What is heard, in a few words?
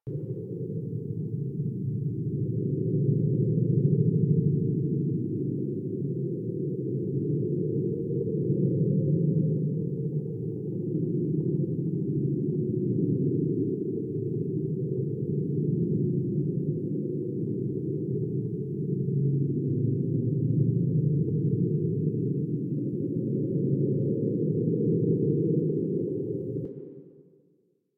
Railjack Cockpit Ambience; Warframe